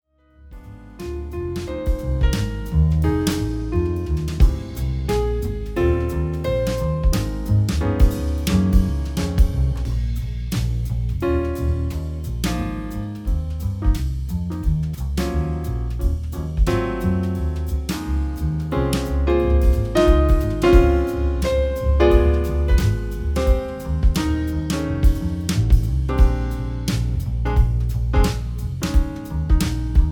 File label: jazz trio